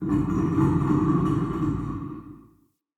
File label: Cave Ocean Creature Far